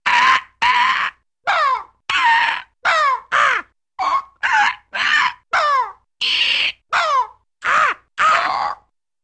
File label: Small Group Herons S, birds